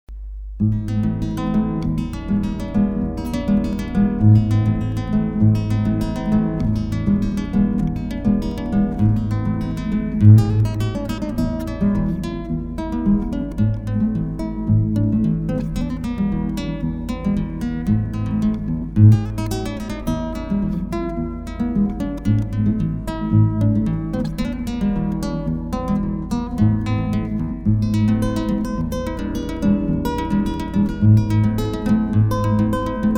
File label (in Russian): Аргентинская мелодия